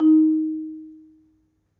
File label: Marimba, D#2; Terkelsen